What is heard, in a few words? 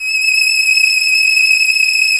STR LUSH S, MIDI Section; String Master Series; Combos 2